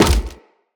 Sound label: Hammer Impact Wood; Weapon Impacts; Player; Environment; Tuvalkane Hammer